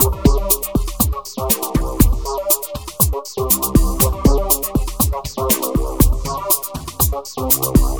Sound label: Elektro, Processed Percussion